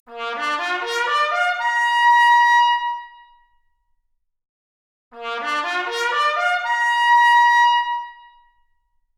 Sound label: Trumpet arp, Stereo output noised; 4bit